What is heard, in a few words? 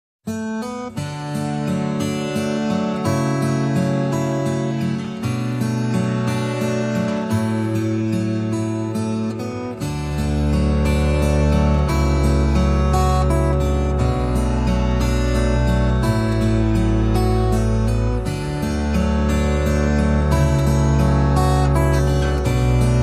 No High Strings